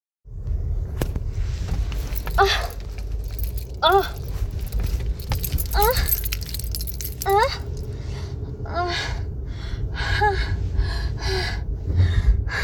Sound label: Moaning in the dark